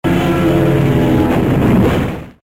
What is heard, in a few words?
Plane Crash